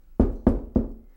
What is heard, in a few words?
Knocks On Wood Door